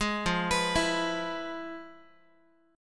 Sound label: F-Minor Major 7th Flat 5th, Guitar; FmM7b5 arpeggiated; JGuitar